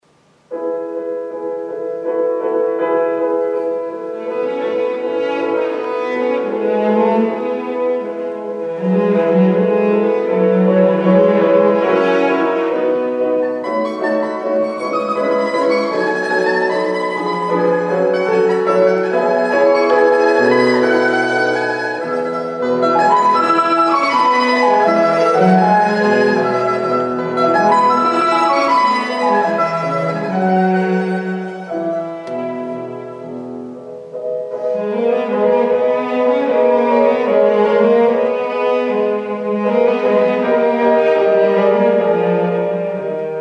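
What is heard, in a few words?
dialogue flute cello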